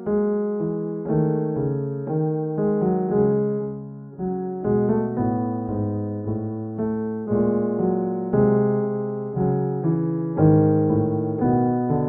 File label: Bach leftHand WienerFilter; BWV849-02